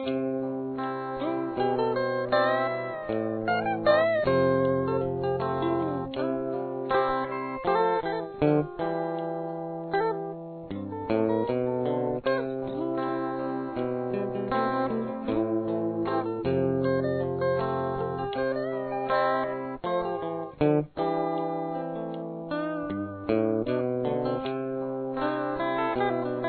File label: solo